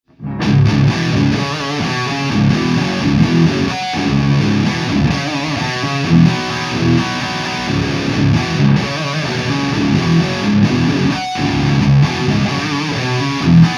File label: Micro Dark Heavy Riff